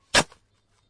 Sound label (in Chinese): 挖土